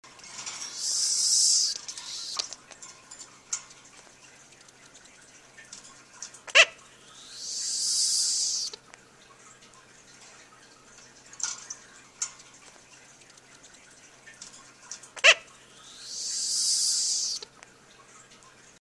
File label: hiss bark, sugar glider